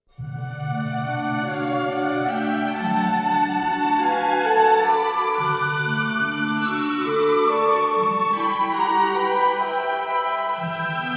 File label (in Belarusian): Vals, opiery